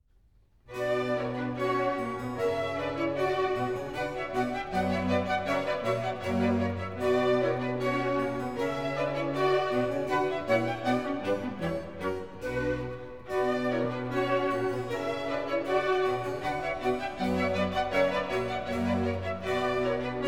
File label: orchestral suite no 2 in b minor rondeau